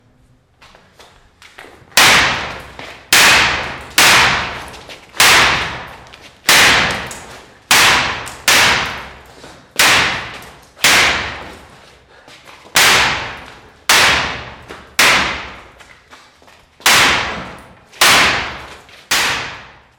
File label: Repeated Slamming Barred Gate